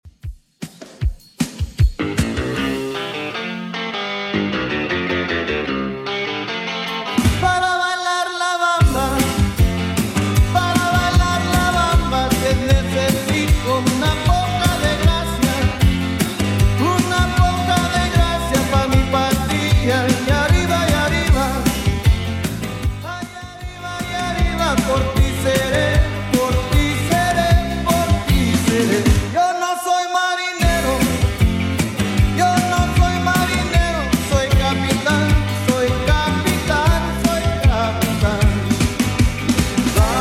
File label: 80s Redrum 154bpm